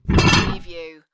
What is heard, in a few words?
Heavy Chain Impact